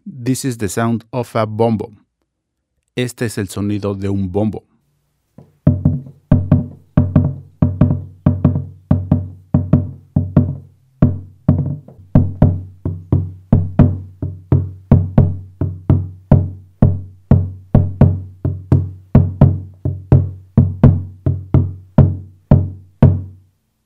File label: Peruvian Music BOMBO DRUM